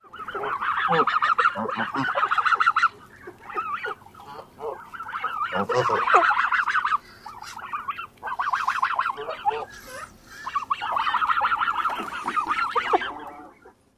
whirring sounds of goslings

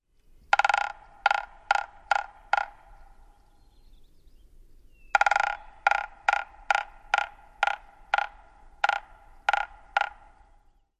drums

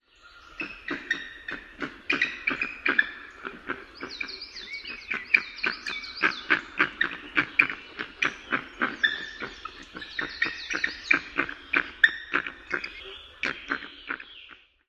alarm calls given in flight

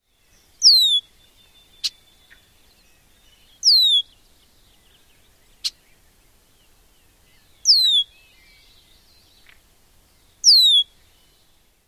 male alarm whistles and chack calls

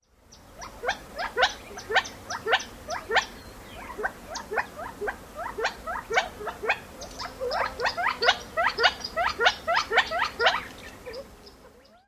other nasal notes

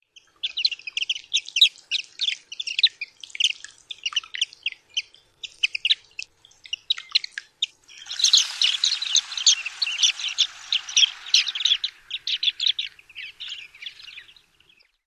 calls flight

